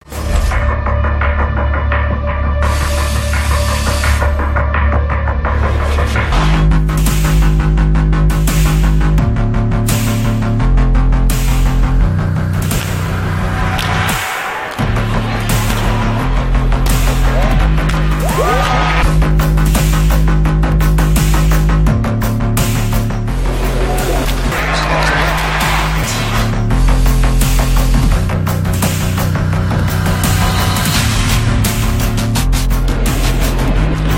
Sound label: Creepy Music; BlueJays; Tim And Sid